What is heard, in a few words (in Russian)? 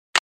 phone